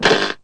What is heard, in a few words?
robot snot; Weird and Interesting Hits; single hits